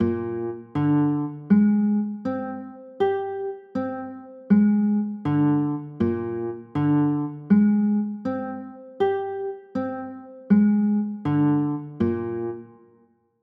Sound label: a sharp 6 9, chords; a sharp 13